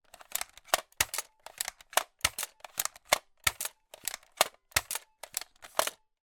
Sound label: toy nerf gun strongarm cock then fire rapid succession